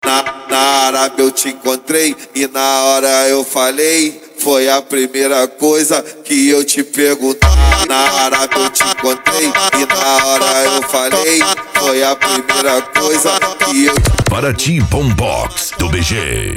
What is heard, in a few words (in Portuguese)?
bombox funk